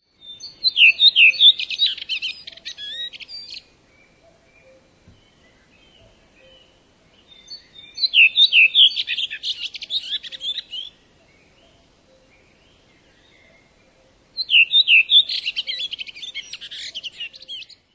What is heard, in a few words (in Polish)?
nieco inny śpiew droździka; wroblowe; turdidae; turdus